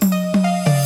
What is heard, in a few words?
Tom Fill, Drums; BB 2HTC Samples Vol 4